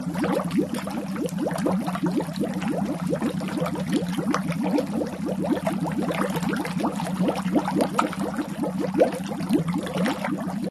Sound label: bubble loop; openspace3d; vrcells